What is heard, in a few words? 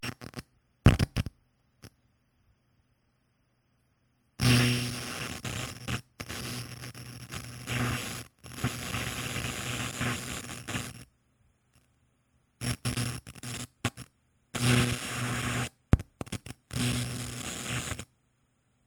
usb noise